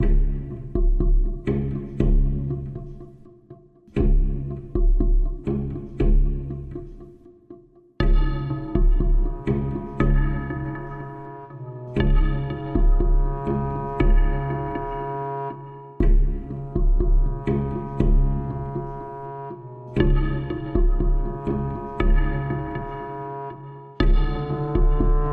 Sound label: Heartbeat